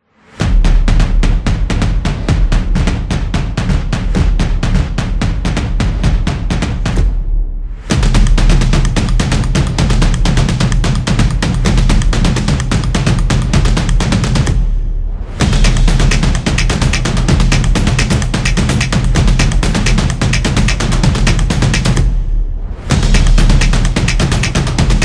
Percussion Sport Trailer

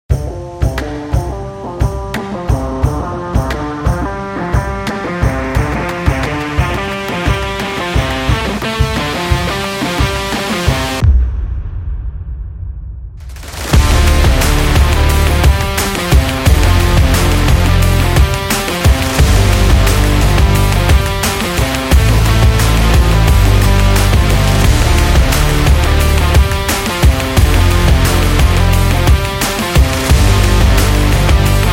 Epic Sport Trailer

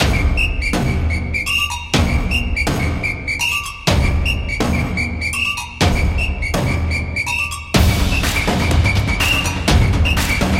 A Football Intro; action sport

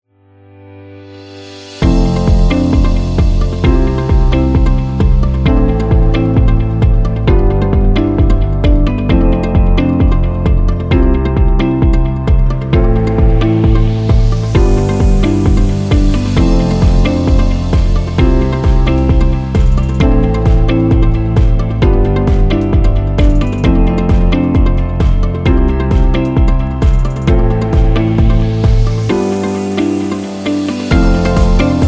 Commerical

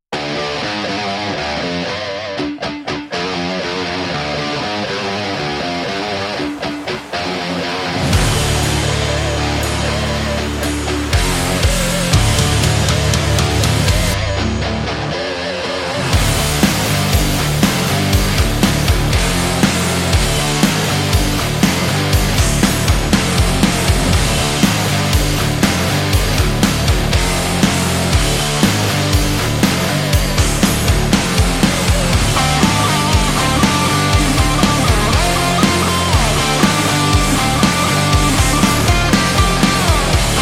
Powerful Cool Rock; active rock music

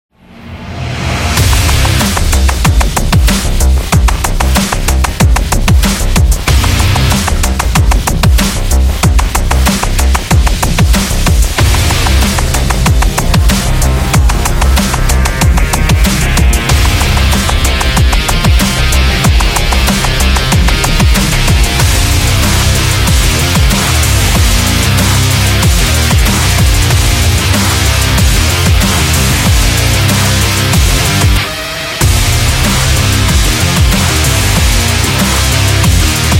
Rock

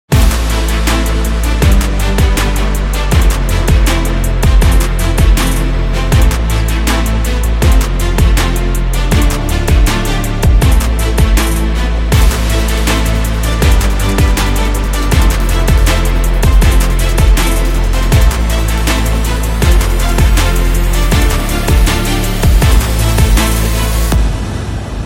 Street Workout

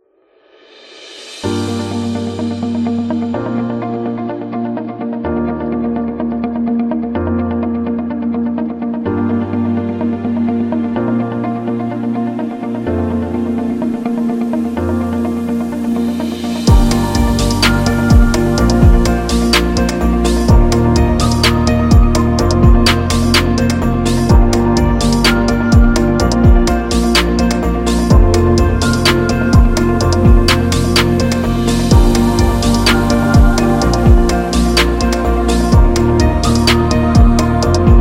Medicine Background; friendly

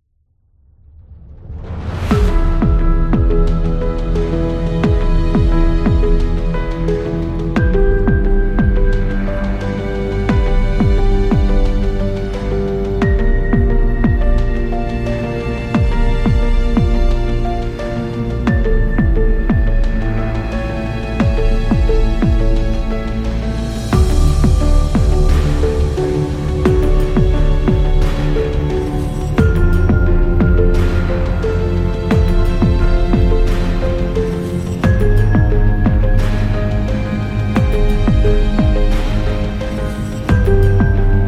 Luxury Commercial; educative

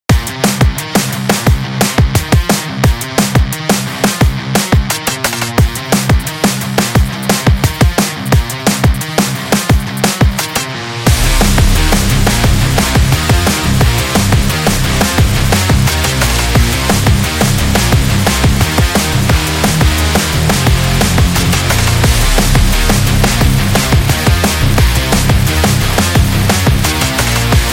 Monster; sports training energy

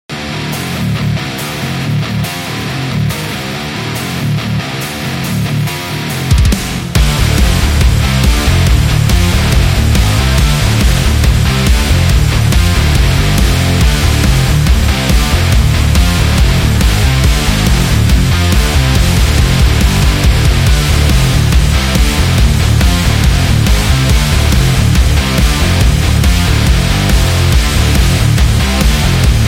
A Metal

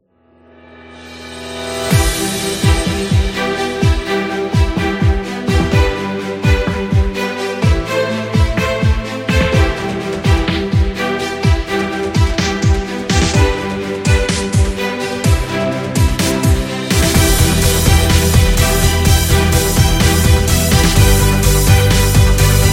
Dubstep Epic

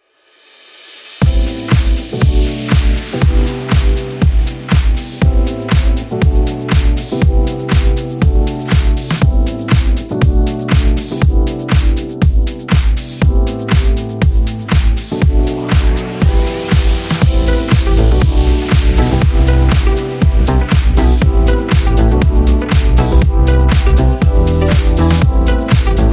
Cocktail Party

